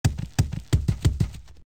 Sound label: scrib Thump; Thumping Scrib